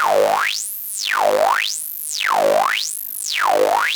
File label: OB VOX A2; Hollywood Edge - Giorgio Moroder Rare Synthesizer Collection; OB 4 VOX.